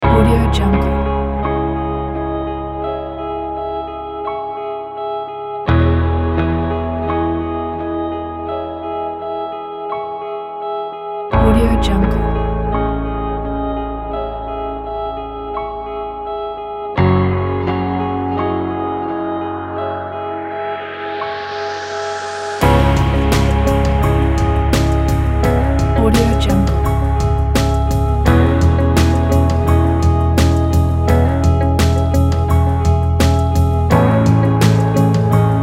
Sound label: action horror